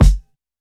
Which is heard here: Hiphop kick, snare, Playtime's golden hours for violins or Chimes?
Hiphop kick